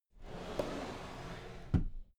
drawer cabinet equipment close soft

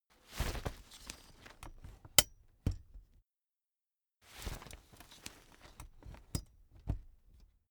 umbrella big open full soft

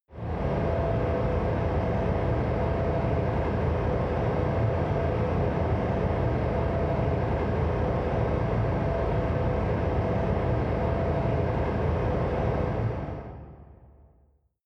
factory hall

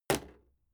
impact sweetener wood soft

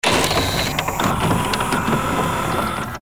machine scream

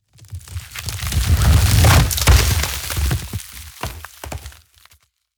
ice medium crash

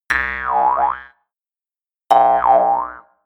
mouth harp

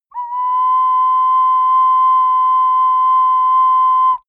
ocarina unstable low long

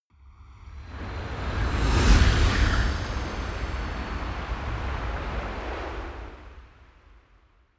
whoosh magic gust

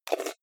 jar plastic midsize close fast

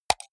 computer mouse right click fast hard

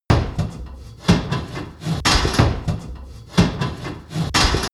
industry machine mechanic

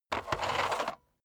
vacuum sweep back

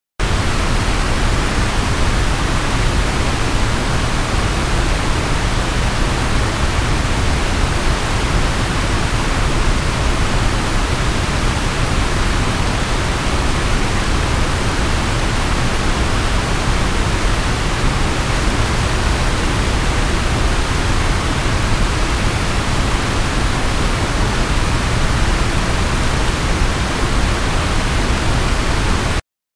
waterfall

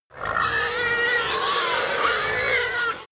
pig herd grunt scream many, pig

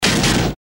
shot

